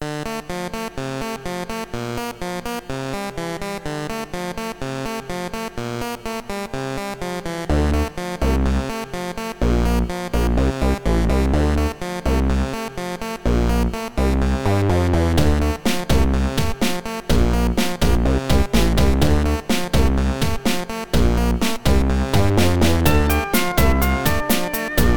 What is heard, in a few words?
Background Music; Udemy Card Battler